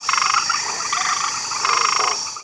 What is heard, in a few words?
Jungle, Birds